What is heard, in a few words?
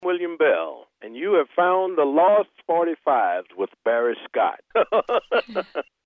Bell